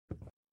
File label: walk wood